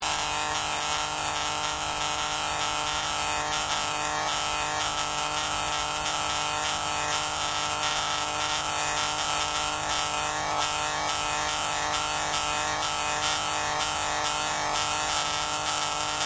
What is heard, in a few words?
TESLA COIL SMALL OPERATING LOOP, Small tesla coil; Zaps; Electronics